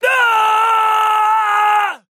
pain laser; Trapper; akira

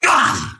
pain big

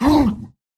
pain small; Slork

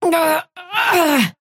pain death

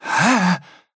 effort dash; Trapper

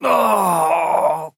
pain low health warning; Trapper

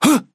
effort dash; Trapper

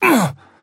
pain small; Trapper

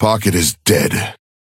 ping synth dead

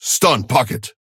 ping stun synth

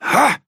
effort melee small; Trapper

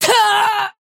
pain laser; akira

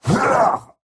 effort melee small; Slork

